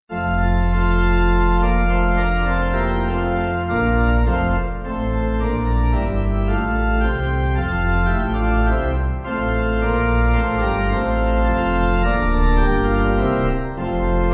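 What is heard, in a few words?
Pipe Organ; Childhood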